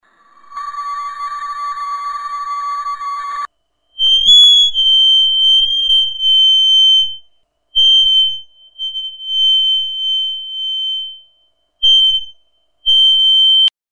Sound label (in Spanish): luz de televisor